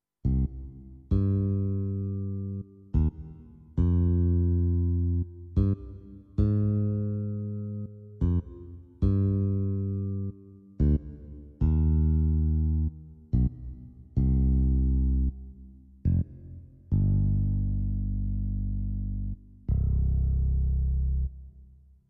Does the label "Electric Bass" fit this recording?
Yes